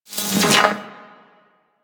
Projectile Whizz By; Enemies; Abilities; Sentient Brachiolyst

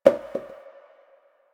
Janitor Paper Towel Drop; Warframe; Ambience; Nineteen Ninety Nine

